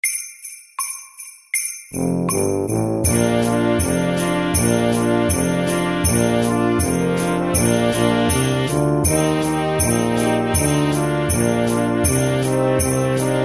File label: Jingle bells duet 80